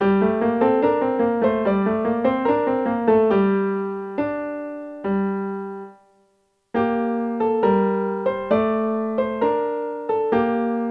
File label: dotted 8th note in Gm